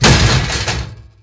metal slam

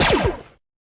blaster dc1, Guns Fire; Weapons

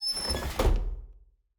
unit close, Vehicles; civ14

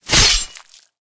slash, melee

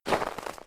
snow, footsteps

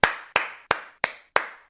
clap

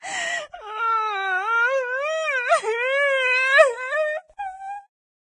female cry; Voices Emotes

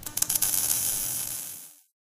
welder; civ13; civ14; Items

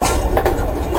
moving, train

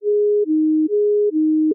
ambulance siren; Vehicle

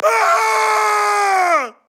male generic warcry

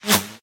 bullet miss; civ13; civ14; Weapons; Guns

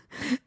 woman gasp; Voices Emotes

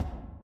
plating, Footsteps

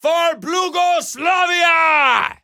charge; Voices Emotes; blugoslavia

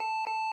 defib ready; civ13; civ14

